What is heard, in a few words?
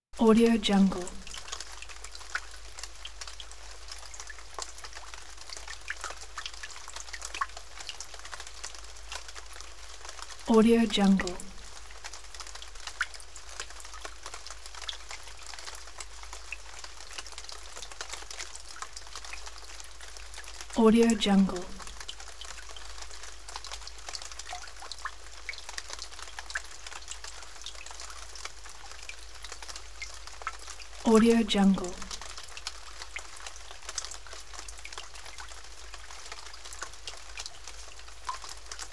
light rain